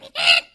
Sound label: Bird Green Talk; fowl